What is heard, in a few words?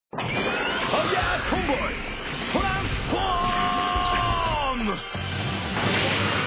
transform; fireconvoy